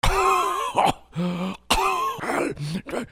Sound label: PEOPLE